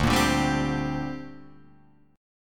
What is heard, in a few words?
D 7th Suspended 4th Sharp 5th down Guitar Open F; D7sus4#5 strummed